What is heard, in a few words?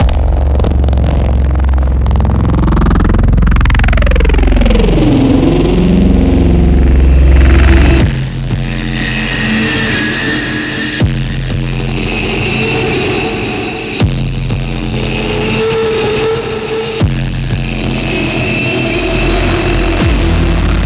Rumble